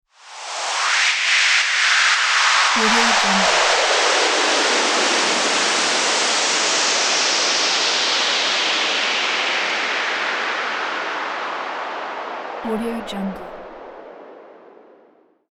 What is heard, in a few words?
white noise transition